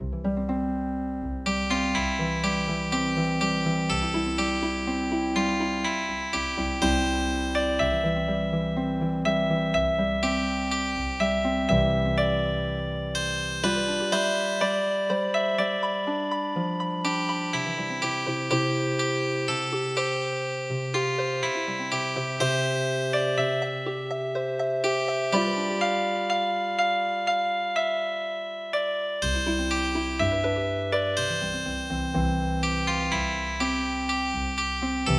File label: Healing Harp, midi traditional